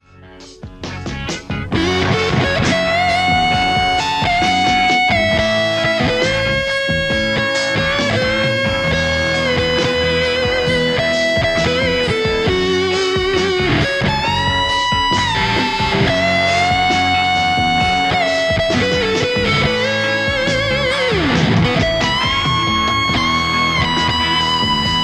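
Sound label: Telecaster; igniteamps nrr1 v2 00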